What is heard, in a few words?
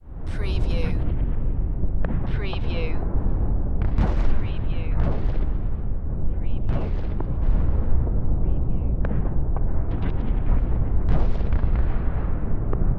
Explosions Battlefield; Sound Effects Bible